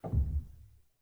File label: rel, Grand Piano; bitKlavier Grand; Piano Bar